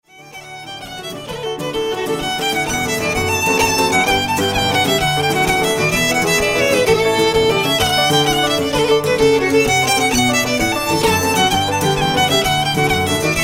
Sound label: D Jigs